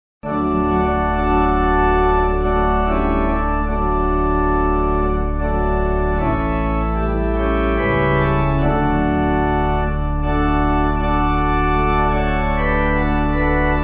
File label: Pipe; I Hear Thy Welcome Voice